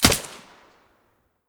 aug silencer stereo, weapons; STALKER; IWP

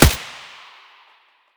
svu muzzle mono distant, weapons

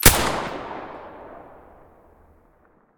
silencer sv98 stereo

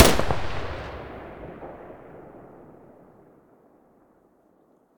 muzzle vector mono, weapons

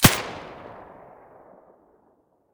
silencer; S.T.A.L.K.E.R.; IWP; vityaz; pp1901

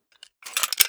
gp25 grenload, weapons; STALKER; IWP

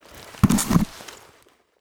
pistol mask use; STALKER; IWP; item usage

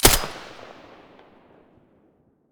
m4a1 silencer stereo, weapons

